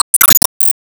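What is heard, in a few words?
Sound Effect Enemy Sandwall Hide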